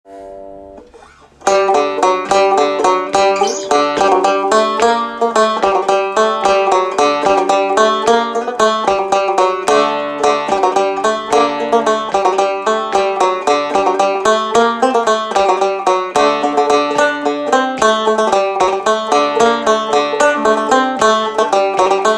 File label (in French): Bourree 3T